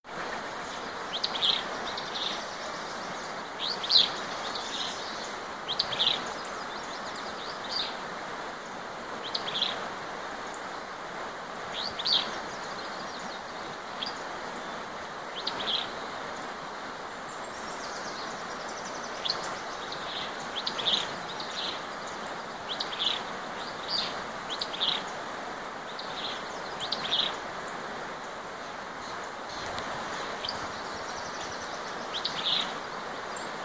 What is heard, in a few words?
Willow Flycatcher Empidonax traillii extimus